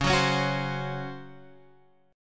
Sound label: C sharp Major 7th Suspended 4th; x,x,x,6,6,8,8,9